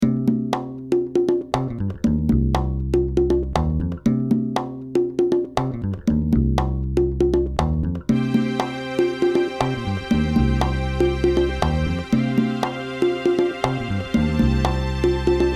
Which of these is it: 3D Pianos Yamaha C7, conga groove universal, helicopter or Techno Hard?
conga groove universal